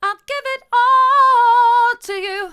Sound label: mid, vocal